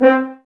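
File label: Brass French Horns C; Sample CDs; Miroslav Vitous; Woodwind Brass Ensemble; F.Horns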